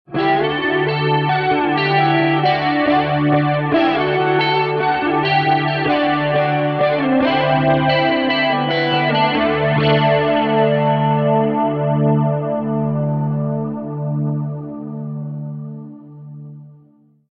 Glassy Waves; elements

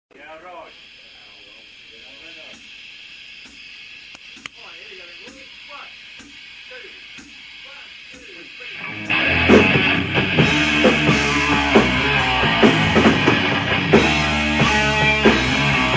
Unreleased Instrumental

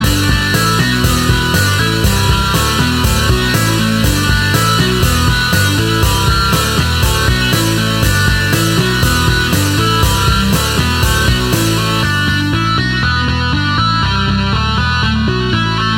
easy rock talk; elements

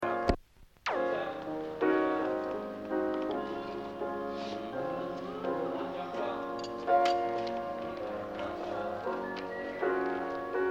Instrumental

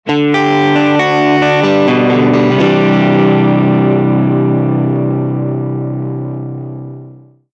Pop Guitar; elements

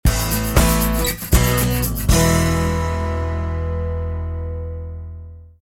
College Pop Bumper; elements

